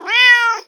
cat

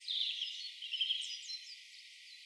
birds 1shot; animal

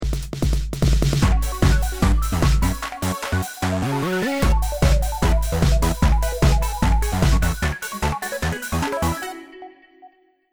bomb ten sec count

